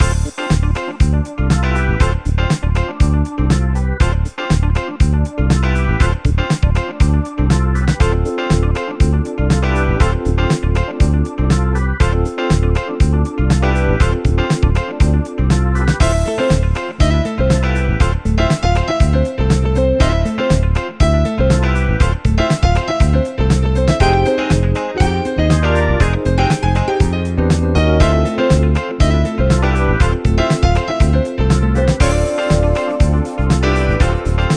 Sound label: MUSIC MAIN; 50 Classic Games 3D; 3ds